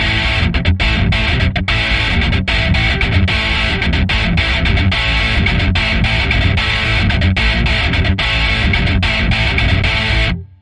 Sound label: Breaker Action C Guitar Only